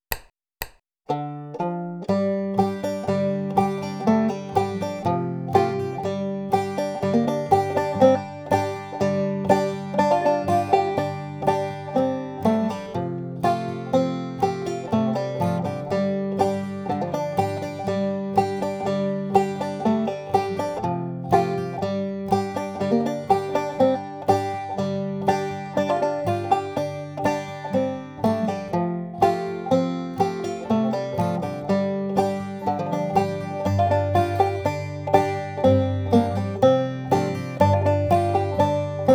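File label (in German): mit hammer on und pull off, banjo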